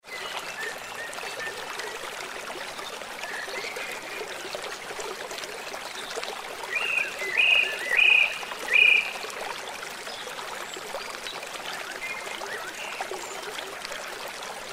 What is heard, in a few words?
Waterfall river creek bird chirps in foreground